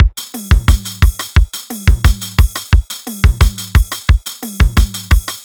Loops, 707; classic machines; 707mod

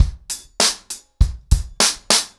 100BPM, ACOUSTIC DRUMS

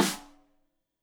Rim Shot Stereo; Deeply Sampled Drums; SMDrum

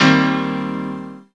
CHORDS; EastWest; Dance Mega Hip Hop Electro

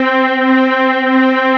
STRINX DN; CMP - Analogon; HP K-STRINX

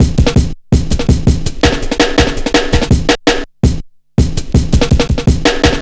165, Drum Loops, Drum & Bass

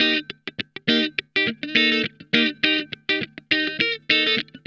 Funk Guitar; Zero G - Funk Guitar

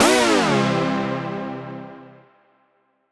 Poly Chord Hits, Ramp Down, C; future rave

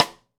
Cross Stick, Rimshots; Roland BK-5

